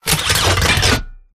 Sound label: gunstand pickup, turret; gow; turret judgement